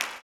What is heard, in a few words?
CLAP, blues & soul; ILIO; Vocal Planet